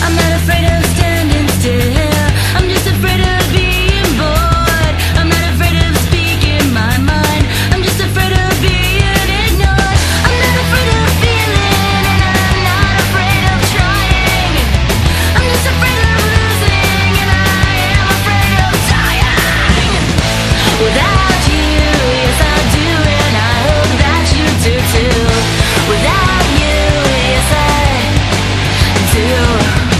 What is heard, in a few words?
dying